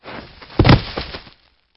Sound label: Death; CastleMiner Z; Xbox 360